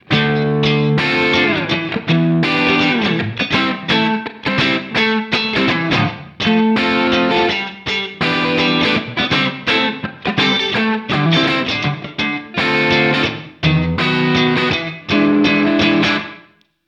clean e guitar mix

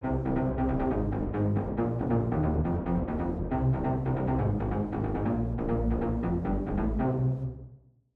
synth bass wet

